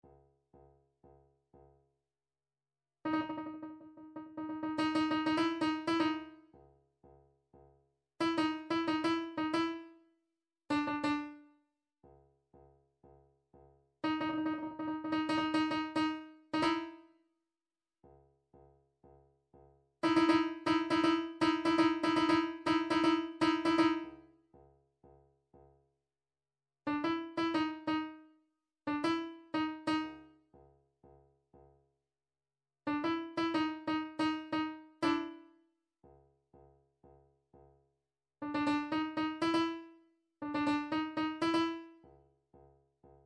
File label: drum trax cong